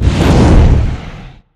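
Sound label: fireplace attack; monster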